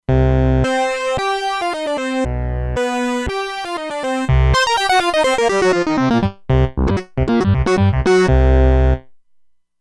Prophet Link, E-mu Proteus-2; Factory Presets

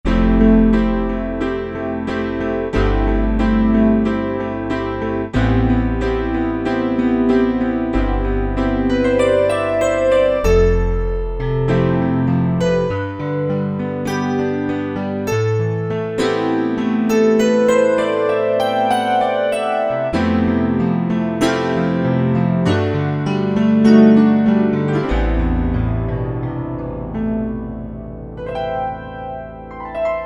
MIDI 3 Grand

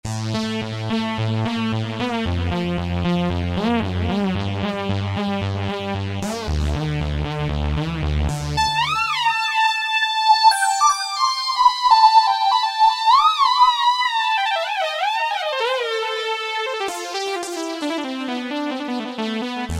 Saw Lead, Roland JD-990; Factory Patches

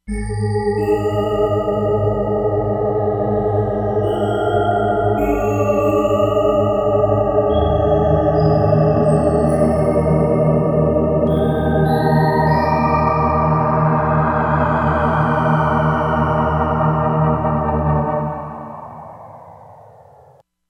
Wind Choir, Korg MSC-07S Synth; Korg MSC-07S Synth 2

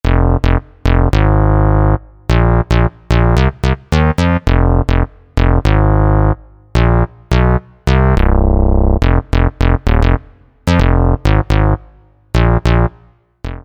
E-mu Proteus VX CZ101

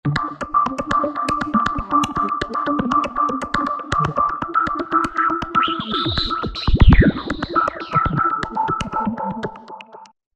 Step by step, Roland V-Synth; Factory Patches

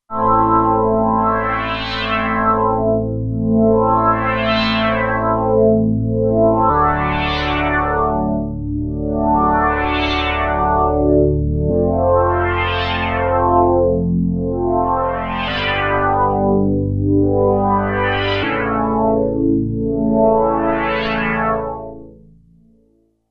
Bi Phase, Roland Alpha Juno-2; Factory 2

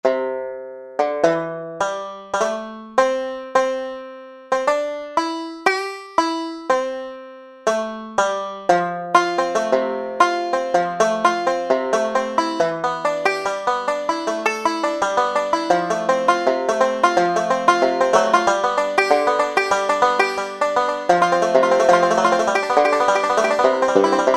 Banjo; Roland SR-JV80-17 Country Collection

